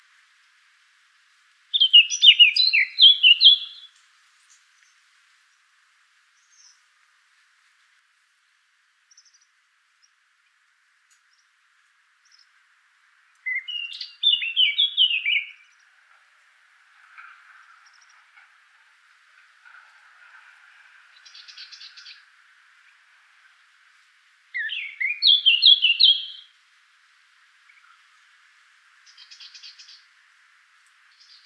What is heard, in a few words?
call, Blackcap; sylvia atricapilla